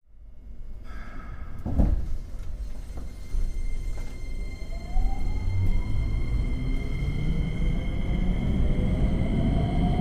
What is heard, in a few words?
train start